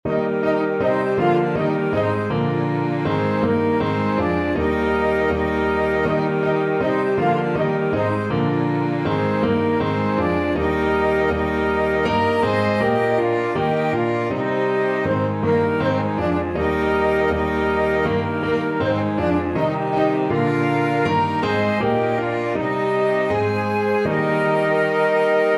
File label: good king, flexible ensemble and piano 4 players and piano